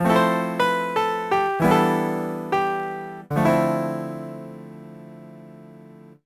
Jingle Lose; asonde igo ga sara ni tsuyokunaru ginsei igo ds chuukyuuhen

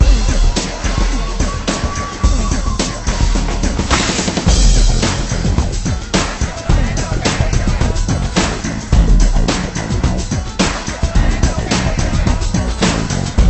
Menu Loop

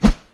flip; Trapeze

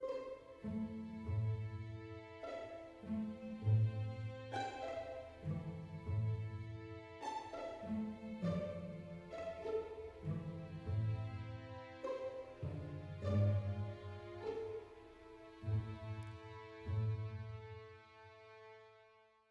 adventure soft

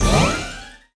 hoop; Ringling Bros. and Barnum & Bailey; Wii

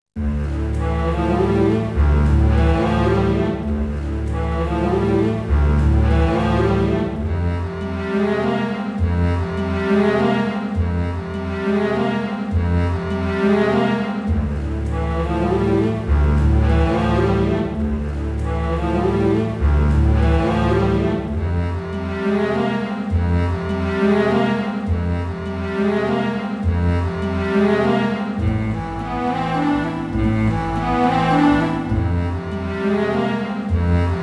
Variation - Passion (Strings); max payne 2 original soundtrack game rip

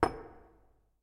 Colision Glasse

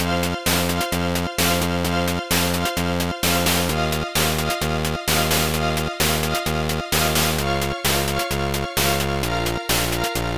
Story Bgm; Adventure in the Tower of Flight

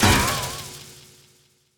Cagedestroy; super mombo quest

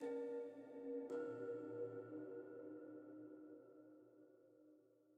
Env Field Day Cave

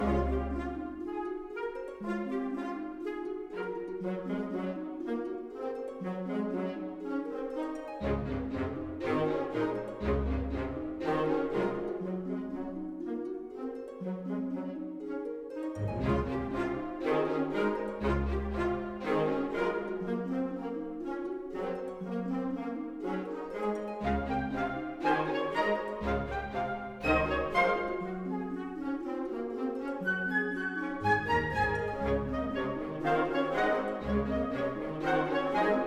Music Dialogue Neutral 60bpm Loop; Asterix Obelix Slap Them All 2

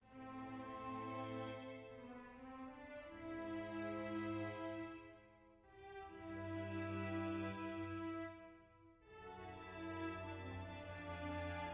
sad soft; Quivr; PS4 Windows gamerip 2016

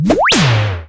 Boss Attack

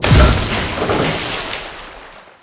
Hit pier

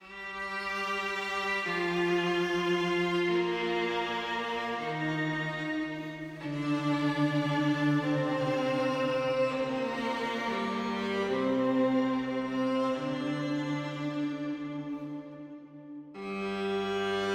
(Violin); Bard Countercharm

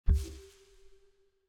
Flower Bounce Small; Desert SFX

